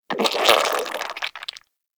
Inside Acid Squelch; Bromius Inside

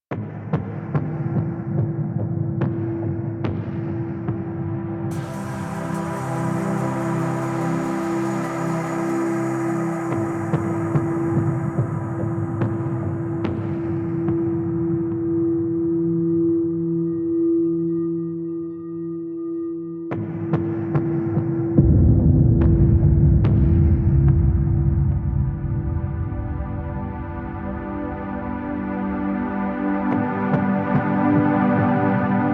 Hub Cue, Music; Warframe; DE; Hubs; Zariman

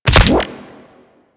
Weapon Punch Shotgun; Warframe; Weapons; Shotguns

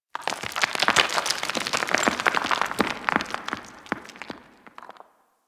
Mining Rock Debris; Warframe; Ambience; Grineer; Grn Mining Shaft

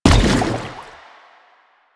Step Default, Footsteps

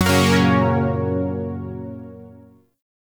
Shawzin Yo Chord F; Chords; Warframe; Zariman

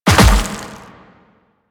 Land Dirt, Footsteps; Warframe; Quests; The New War; Kahl

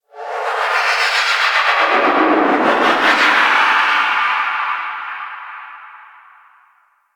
Shriek Tonal Verb; Undercroft; Ambience; Warframe; Duviri

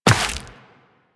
Step Rock, Footsteps Walk; DE; Warframe; Tenno

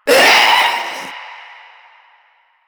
Proto Infested Runner Vocal Short Attack, Enemies

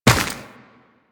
Run Dirt, Footsteps; Warframe; Tenno

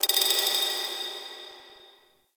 Moon Clockwork Texture Small; Ambience; Warframe; Orokin

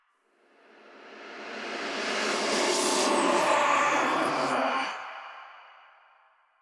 Cave Whispers; Orokin; Warframe; Ambience; Stalker

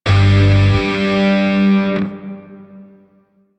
Shawzin Maj Chord K; Grineer; DE; Warframe

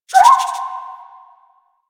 Rabbit Ambient Call; Duviri; Animals

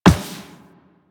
Walk Metal Perforated, Footsteps

